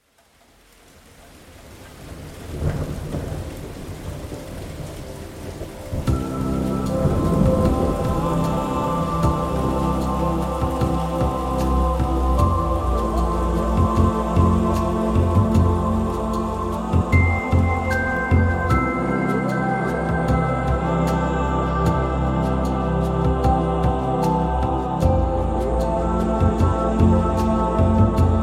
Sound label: Guitar